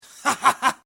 Laugh Male Laughter Laughing Male; human sound effects; Lynne Music

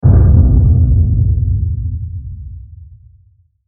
Hit Low Rumble; Bass Button; Production Element Imaging Element Accent Transition; Blastwave

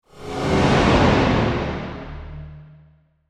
Scary Horror Transition With Reverb; Scary Soundscapes; Crafted Signals

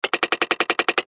Button Switch Gear Multimedia; Web Interactive; Technology; Blastwave

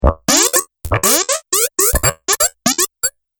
Little Bits Of Laser, laser sound effect; Sci-Fi Laser Sound Effect; Andrew Fleming

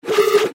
Rollover Beep Tone Multimedia; Web Interactive; Beeps; Technology; Blastwave

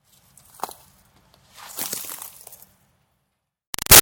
Snow Dust Drop Debris; Weather; Big Room Sound

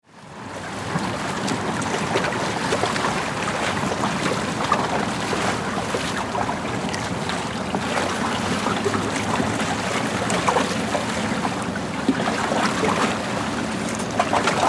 Ambience, Pier, Dock, Perspective, Waves, 5.1; BlastWave

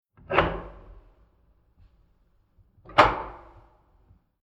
Apartment Hotel Inner Doors Open Unlatched; Miscellaneous Door

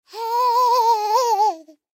Human Moan; Moan Vibrato Male Toddler; Pain; Sound Effects:Voice

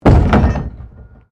PLASTIC BARRICADE HIT; Material components for constructing more complex sounds

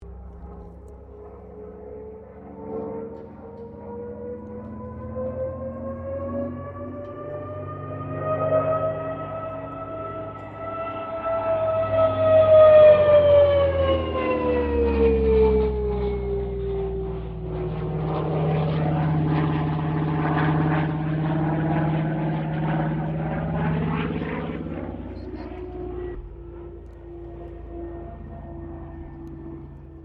AIRCRAFT CA-13 BOOMERANG PASS STEREO

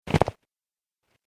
Snow Foot Step; Footstep Sound Effects; human sound effects

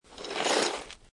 Snow And Ice Ice Skate Slide; Weather

